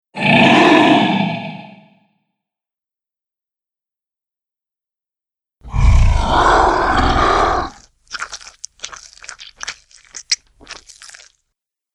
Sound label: Dragons, monster; horror